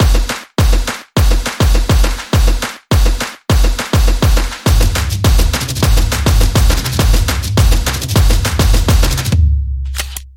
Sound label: Football Field; action sport